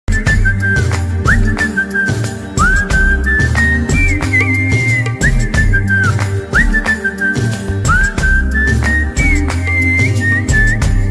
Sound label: telugu love ringtone telugu ringtones bgm ringtone